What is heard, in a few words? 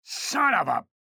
pain ability damage; ranger; low health